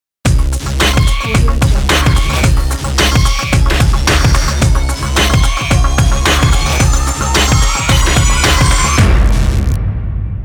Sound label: electronic industrial intro beat logo